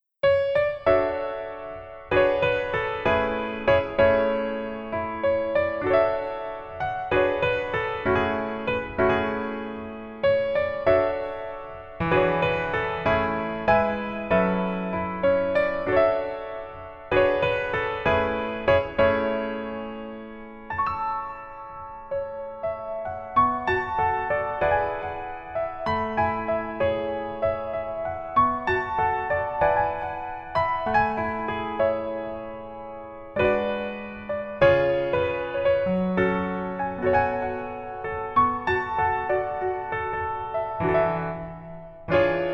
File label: Piano ver, 멜로디MR